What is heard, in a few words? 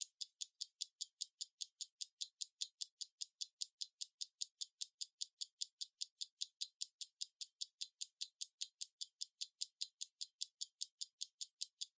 Stopwatch Mechanical Tick Table